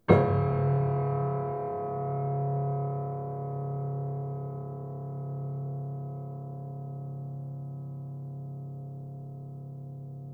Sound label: Harm Ped Low, Piano Harmonics